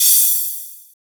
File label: large room open hi hat A# key; Duration 0:01; Bitdepth 24